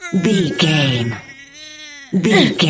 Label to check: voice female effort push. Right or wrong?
Right